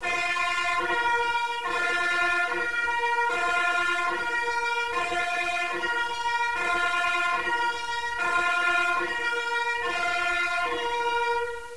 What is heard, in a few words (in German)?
sirene